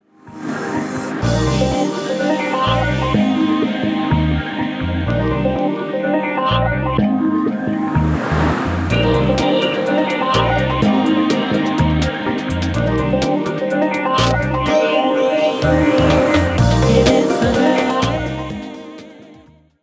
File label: early morning breaks intro